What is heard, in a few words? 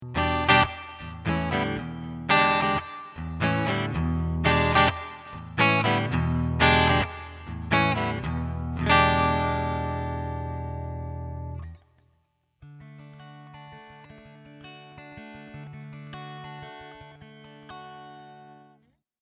DUM BOX CAB SIM DRY